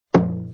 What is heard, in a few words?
barrel hit